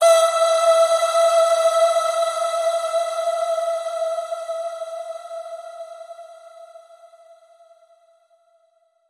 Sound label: Heavenly Voices Ethereal Ping